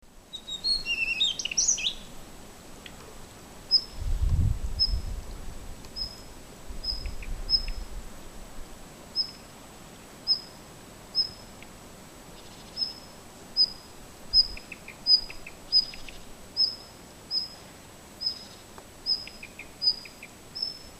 Phoenicurus auroreus male alarm and song, background Parus; Bolshiye Koty; Parus major